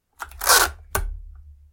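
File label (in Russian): скотч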